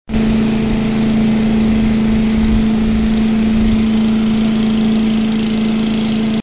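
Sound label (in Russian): gazonokosilka sozdaet grokhochushchij shum ot gazona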